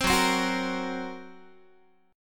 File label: Gb Major Flat 5th, down, Guitar